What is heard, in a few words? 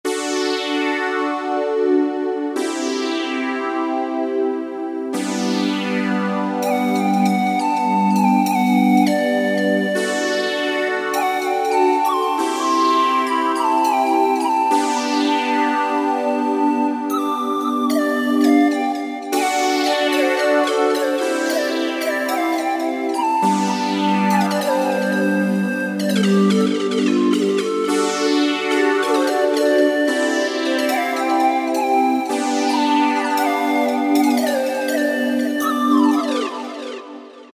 SoftFlight, Generalmusic S2R Performance